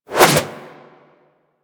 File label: backflip whoosh; Warframe; Generic Foley